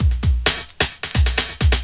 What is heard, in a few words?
Impact; Urban Shakedown